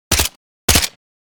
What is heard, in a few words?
MK2 assault rifle suppressed fire; war; gfxsounds